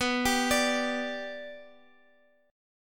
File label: B-Minor Sharp 5th, Guitar, Open G